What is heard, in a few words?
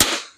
pinata explode